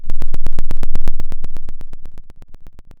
pop, Sound of a thunderstorm

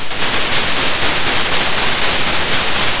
Sound of a thunderstorm

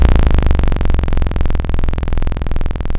pop, Sound of a drum roll

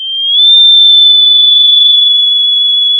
pop, sound of a tea kettle whistling

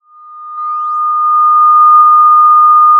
Sound of a police car siren

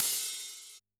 Op Hat, drum

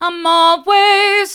i'm allways hard, vocal

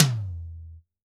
Tom, drum

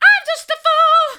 i'm just a fool hard, vocal

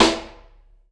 Piccolo E, drum

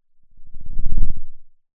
pop

